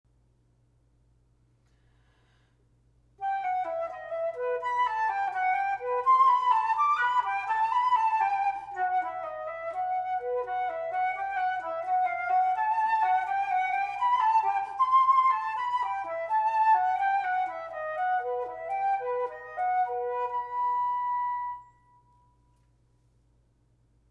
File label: Flute Lyrical